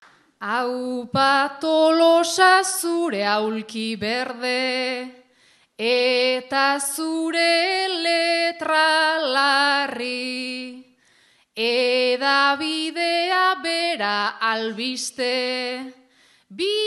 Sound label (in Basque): agurra, bertsoa; tolosa